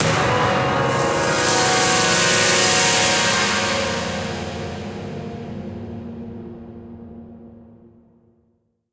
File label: stinger